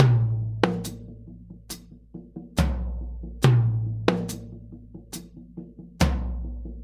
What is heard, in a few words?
Earth Loop, Drum Tools, Laid Back